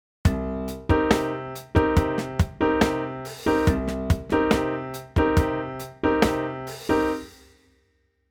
funk piano rhythm chords

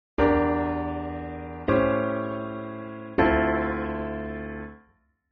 jazz piano 7 3 6 or minor 2 5 1

